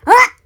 Lurker Startle

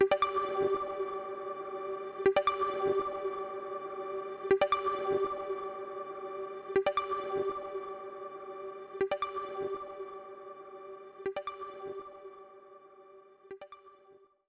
Progression Stinger

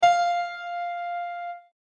Combo; machi koro with everyone; switch windows

